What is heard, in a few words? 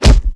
clothes; monster